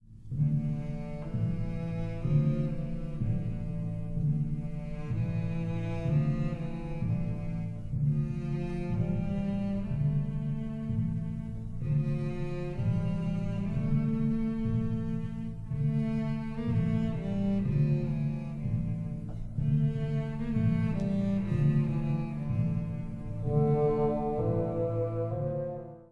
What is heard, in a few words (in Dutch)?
contrabas karakter